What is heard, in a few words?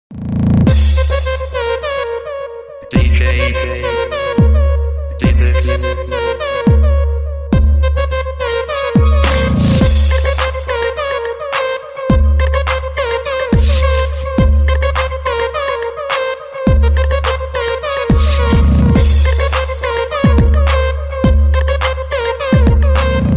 remix ringtone